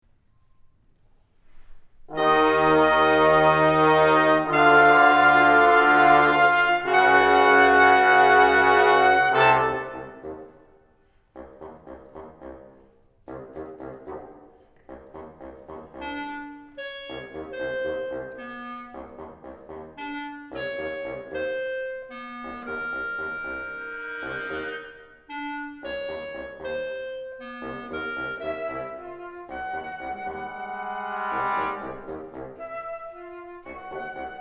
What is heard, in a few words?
octet